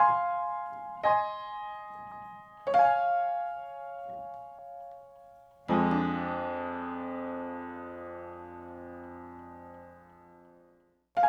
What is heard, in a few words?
Piano Ending, 70s Analog Funk; Sonic Foundry (Sony Creative Software); What it Is! 70s Analog Funk